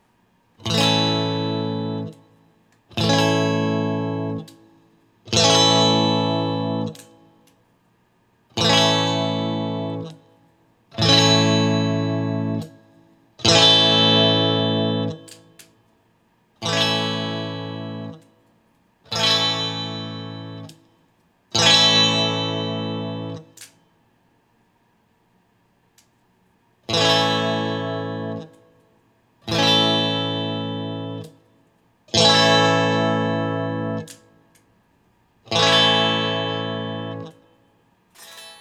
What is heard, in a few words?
Guild Liberator Elite All A Barre Normal; 1988 guild liberator elite